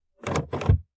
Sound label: vent lever; dashboard accessories